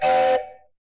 train whistle; Misc Percussion

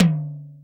Toms

